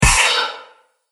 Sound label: Starship Door; Science Fiction Sound Effects